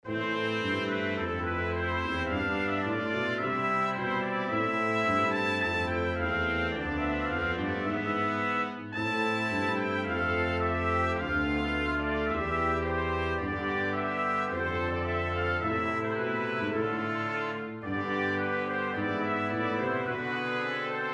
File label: Brass Quintet; Autumn Song